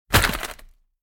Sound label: horror bone crack